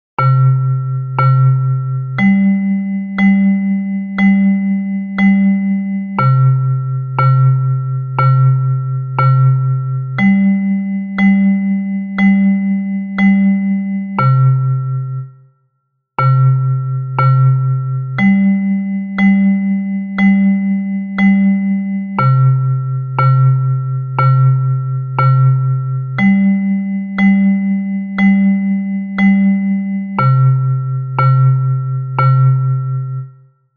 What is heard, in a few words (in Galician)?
Metalofono Baixo